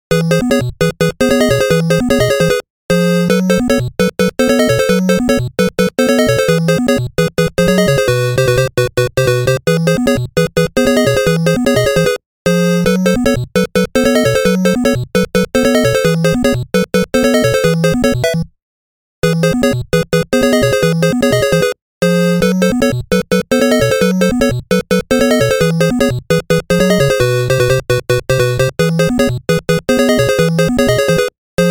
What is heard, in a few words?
2nd 3rd Place BGM; Family Circuit